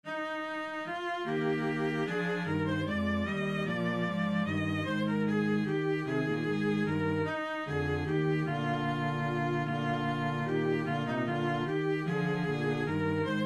minuet Full, viola

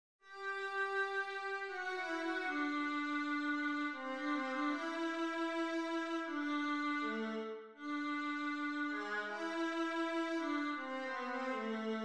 nocturne viola

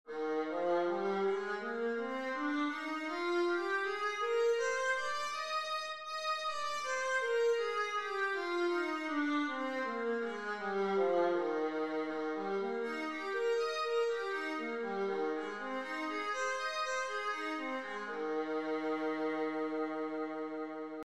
viola e flat major, scales

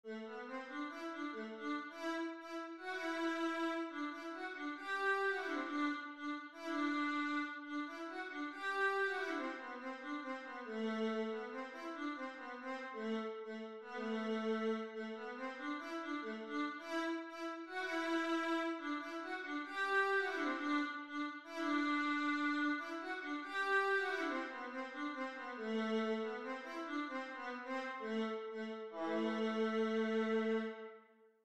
braul vla

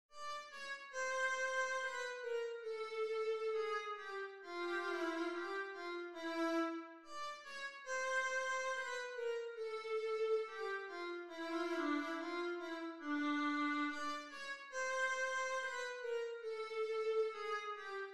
habanera vla

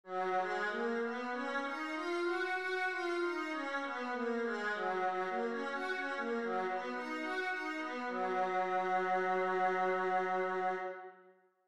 viola G flat major; scales

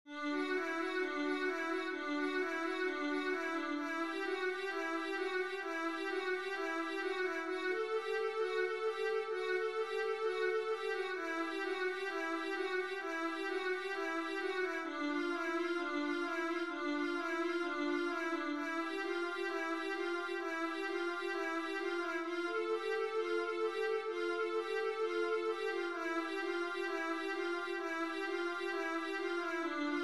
vla etude, viola

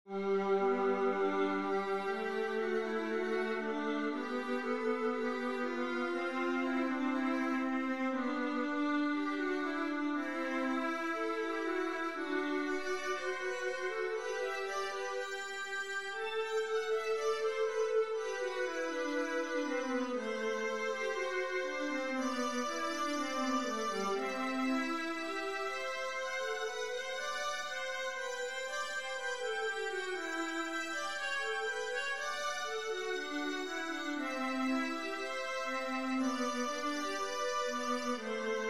viola g major scale duet; scales

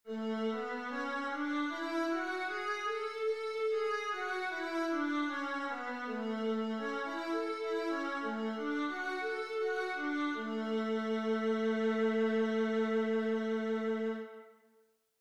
viola a major, scales

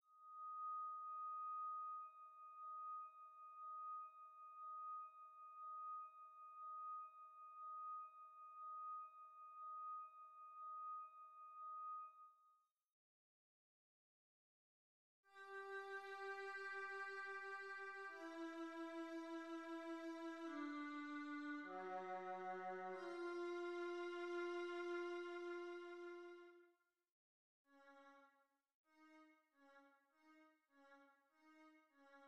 sehr langsam vla, viola

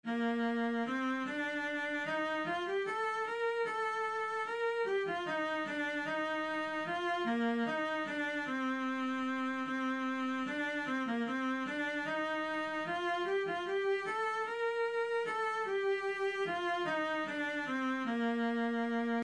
minuet Vla, viola

